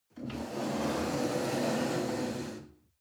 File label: chair wood slide on floor soft